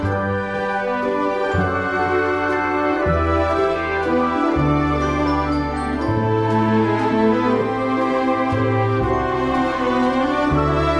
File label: music menu loop; Candy Crush Friends